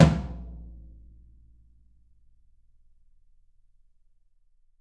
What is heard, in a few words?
YAM 18 ROOM, Drums; Big Fish Audio; Ross Garfield Drums VOL-2; TUCSON KIT